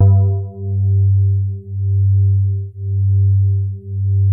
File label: SOFT G1, synth pluck; Music Store - Claudius Diemeter Schwerlos